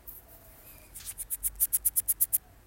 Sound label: Pygmy possum